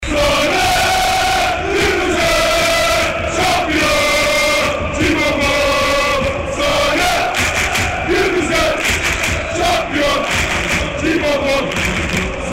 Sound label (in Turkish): sari kirmizi sampiyon cimbombom, Chants; Galatasaray